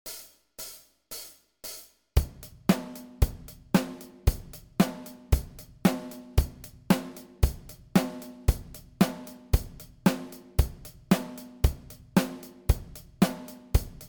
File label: Drums 114bpm